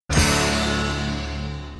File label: Orchestral Hit